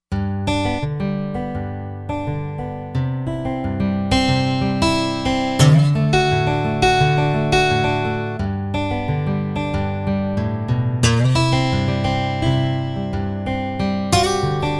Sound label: Comp Stl Gtr, Roland Fantom XR